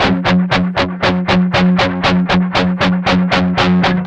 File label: 8TH G 2, Guitars; Spectrasonics; Hans Zimmer Guitars VOL-1; 128-JACKHAMR